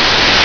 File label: hissss